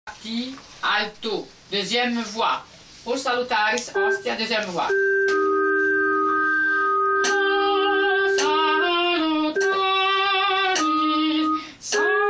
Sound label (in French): o salutaris hostia alto